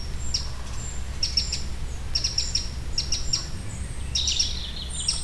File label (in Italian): verso canto scricciolo troglodytes eurasian wren chochin comun; bioacustica